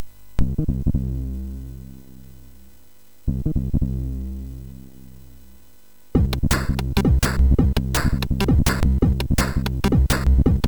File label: Break sid MOS6581R4; hvsc